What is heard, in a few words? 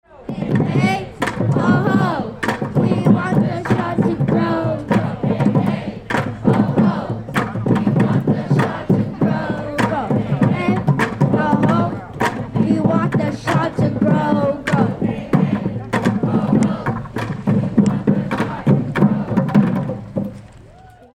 Chant; Shaw Audio